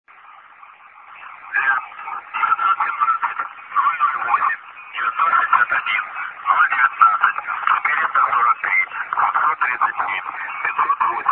5449 kHz

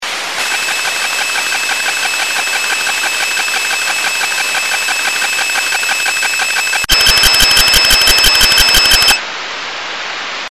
digital

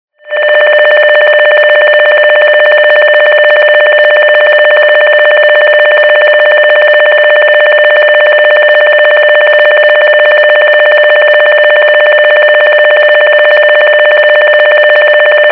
NFM

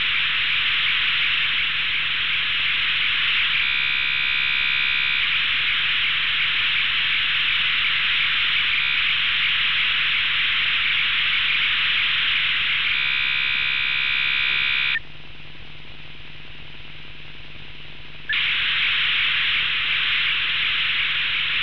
ofdm usb

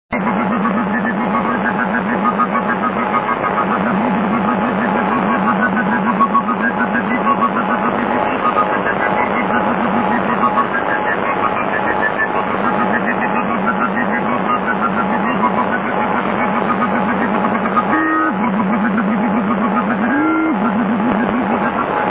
5mhz strange signal

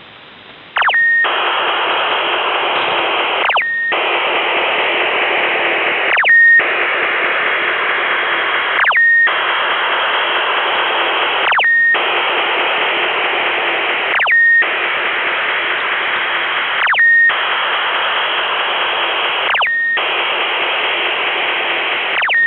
ofdm-64qam; novij signal-gibrid na kv

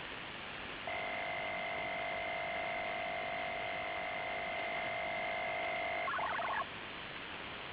4827 khz usb mfsk 8(9) Thrane & Thrane TT-2300

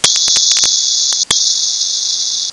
psk 2

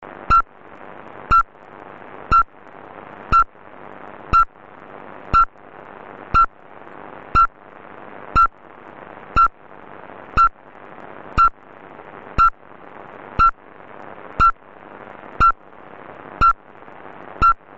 usb

